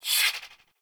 Swing, Melee; Balance Two; Warframe; Weapons; Modular Melee

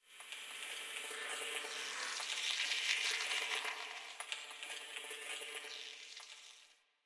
Venus Solaris United Data Texture, Ambience; Warframe; Venus Open World

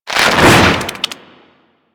Infested Devourer Movement Whoosh; Warframe

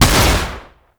Infested Shotgun Shot; Weapons

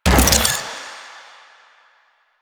Ball Projectile Hit; Warframe; Stalker

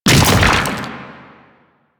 Octopede Horrors Arm Spawn B, Sounds Enemies; Warframe; Man In The Wall

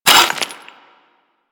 Melee Impact Cement; Warframe; Weapons

